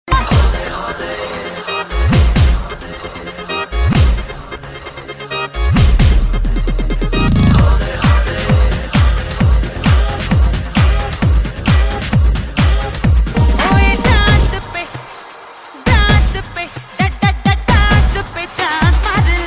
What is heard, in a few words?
R N B D J